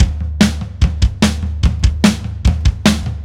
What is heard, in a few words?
Beat, Indie Rock